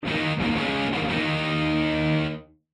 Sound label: Rock; stingers; energetic